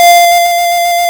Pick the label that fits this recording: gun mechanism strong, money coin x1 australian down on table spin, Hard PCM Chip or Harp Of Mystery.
Hard PCM Chip